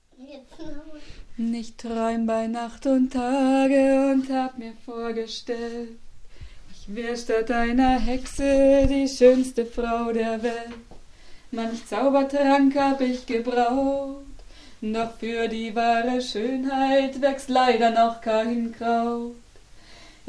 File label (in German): Hexenlied vocal